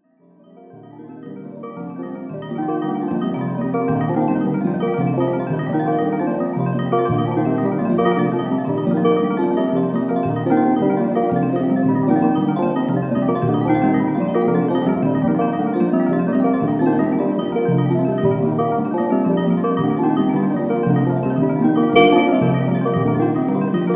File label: synthetic bell piece